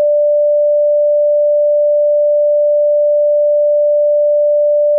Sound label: buzzer low